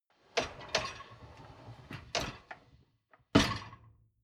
dishwasher lower tray draw in long

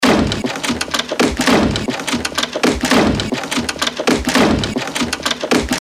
machinery loop

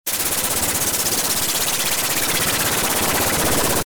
move fluttered noisy fast

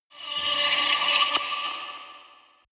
rise crawl stinger

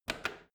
washing machine rotary button press hard

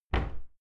cupboard close normal

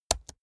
keyboard laptop space press slow hard

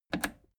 plastic button scratch click disable fast

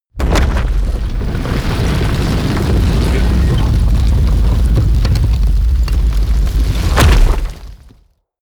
gate stone large close

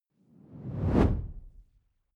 whoosh simple hard incoming

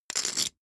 poker chip stack big rattle

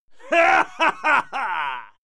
male laugh confident

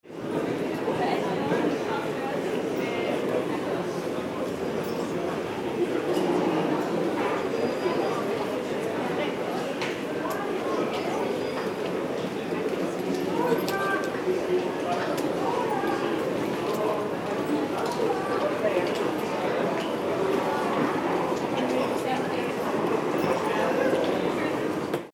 airport atmo waiting area

